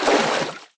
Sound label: Footsteps